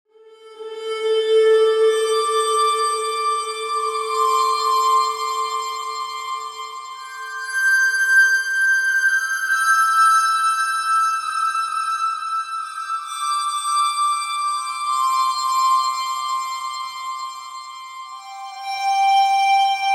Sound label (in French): Sonnerie Eveil Matin